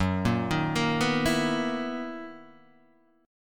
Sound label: B Major 11th Gb